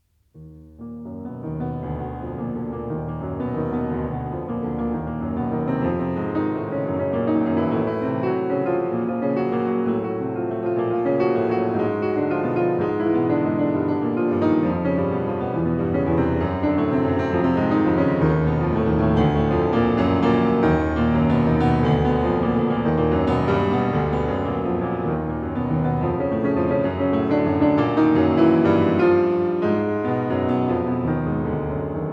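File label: Prelude in E flat minor, piano; Chopin; Op.28 No.14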